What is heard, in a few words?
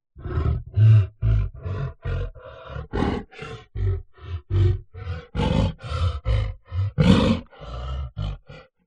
animals gorilla vocalisations